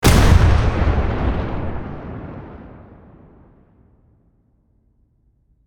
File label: Cannon fire single shot; artillery; gfxsounds